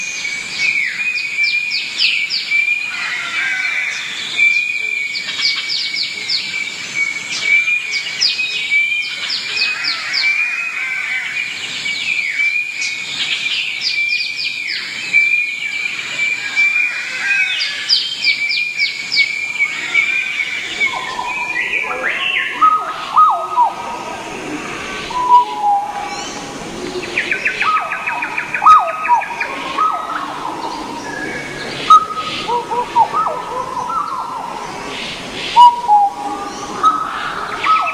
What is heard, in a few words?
forest; cstrike